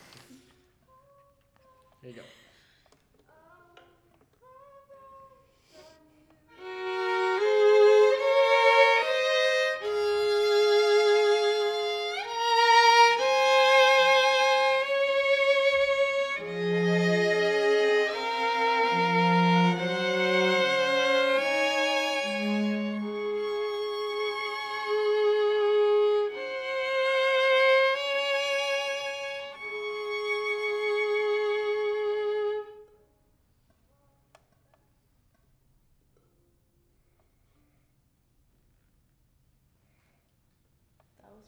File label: violin